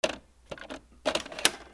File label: HOUSE